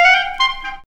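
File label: clarinet riff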